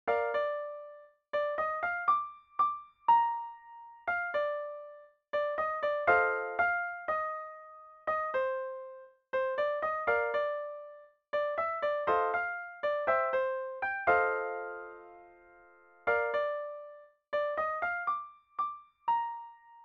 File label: piano